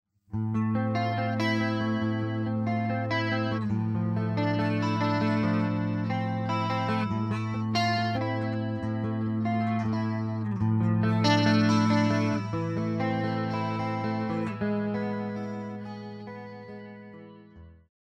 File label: Chorus